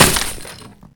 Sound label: Crate Break